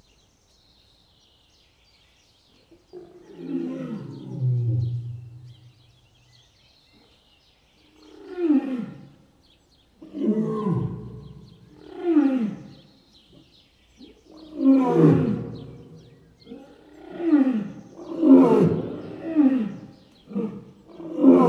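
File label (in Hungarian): balrol a hazbol a him jobbrol a nosteny, oroszlan; debreceni zoo 2012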